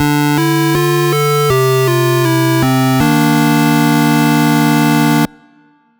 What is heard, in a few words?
8bit game over